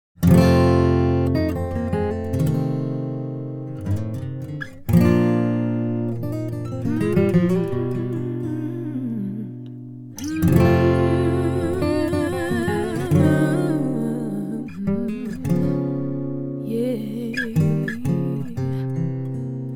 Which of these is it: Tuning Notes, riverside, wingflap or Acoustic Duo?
Acoustic Duo